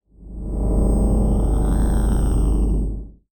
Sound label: Hologram Landscape; SpaceshipDemo